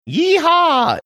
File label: yeehaw